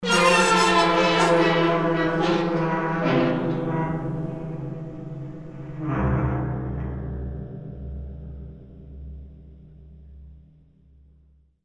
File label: metallic scream